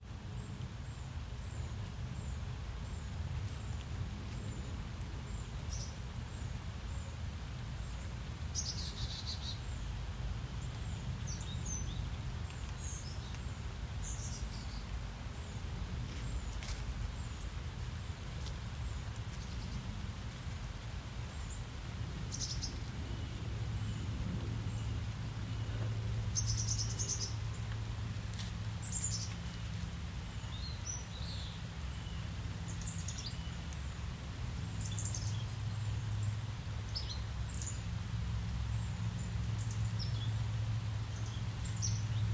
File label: light rain and chickadees; field recordings; scout island